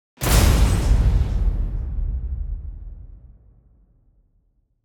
gigantic glossy hit landscape; WNF ANALOG LIBRARY

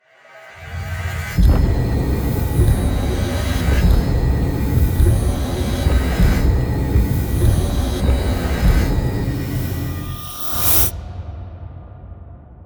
INDUSTRIAL BREATH DARK RYTHM; WNF ANALOG LIBRARY

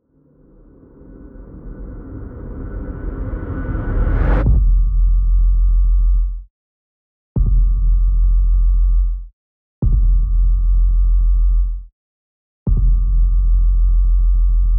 ANALOG DRONE TELLURIC SEISMOGRAPH ALARM SEQUENCE; WNF ANALOG LIBRARY

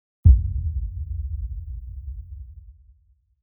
ULTRA LOW HUGE BALL HIT; WNF ANALOG LIBRARY

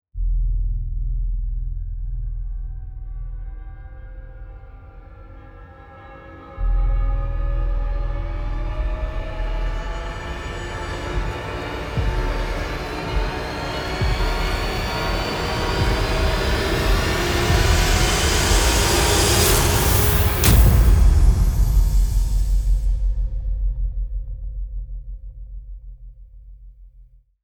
ELECTRO LONG RISE DRONE PULSE METAL; WNF ANALOG LIBRARY

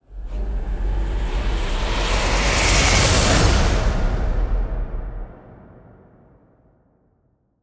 ROTATOR RISE; ANALOG; WNF ANALOG LIBRARY 12 – ROTATOR RISE THEY ARE COMING; WHITE NOISE FACTORY

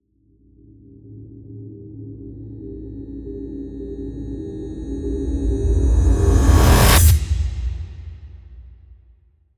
TELLURIC DRONE TO HIT; WNF ANALOG LIBRARY

